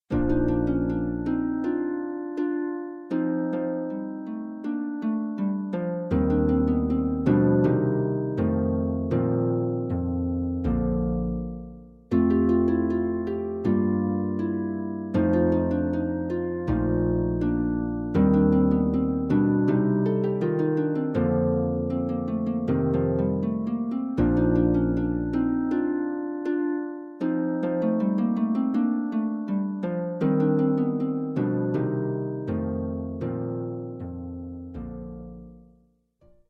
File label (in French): Sonate pour la harpe